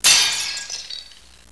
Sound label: breaking glass